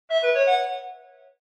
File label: notification